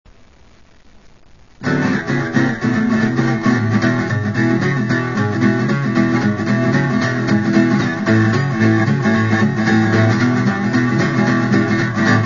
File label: blues jammin